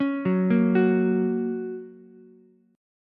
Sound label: Bb Minor Sharp 5th, Ukulele; 6,6,6,9; arpeggiated; chord shape